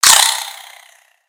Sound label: Spider Die; Lego; creatures mechanical